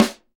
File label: SD, Real Kit; 90 s Sample CDs; Sound & Vision - Gigapack I CD 1 (Roland); Real-Kit m10